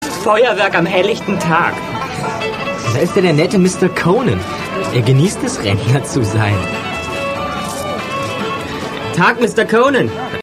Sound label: texas stomp